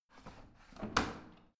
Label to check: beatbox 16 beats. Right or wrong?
Wrong